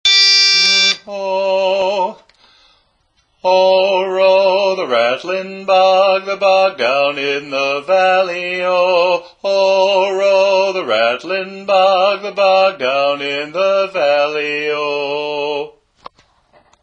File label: bass; Rattlin Bog